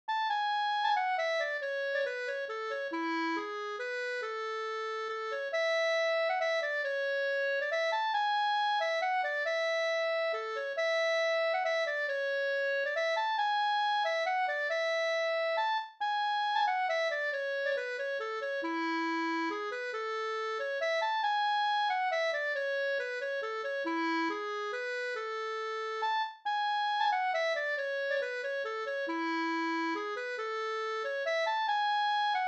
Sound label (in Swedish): langdans; fr Orsa SvL Da